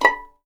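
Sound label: B5 pizzicato MF, The Ultimate String Collection; East West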